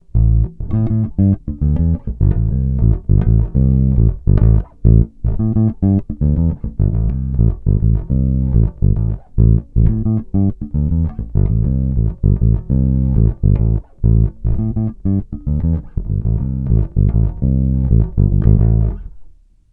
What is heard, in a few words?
Fingerfunk neck coil